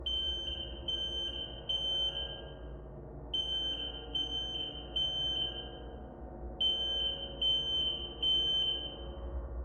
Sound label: Distant Fire Alarm; Lethal Company